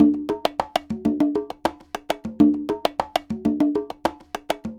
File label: Conga Groove, Latin Percussion; Sonic Foundry (Sony Creative Software); Joe Vitale Latin Percussion; Grooves